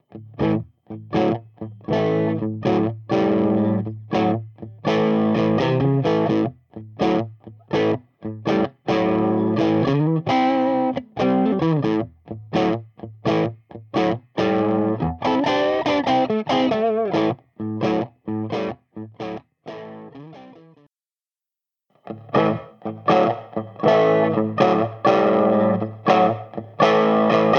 G12M Strat FX

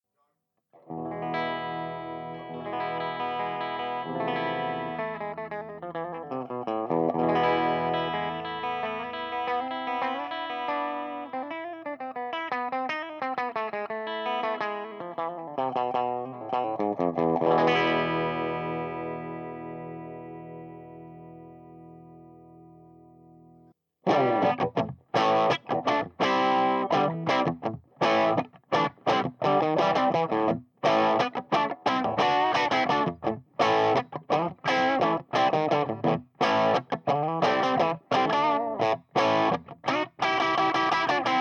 G12M Les Paul

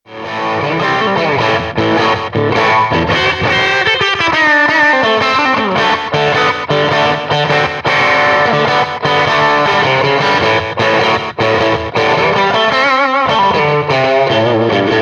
Samples FX Strat Les Paul Gretsch; Sir Charles

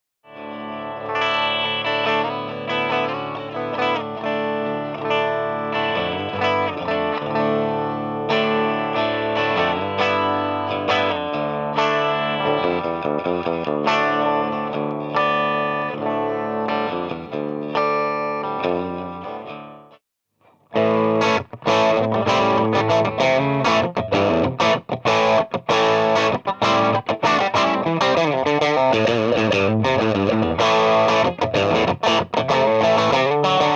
Ace 25 Tele

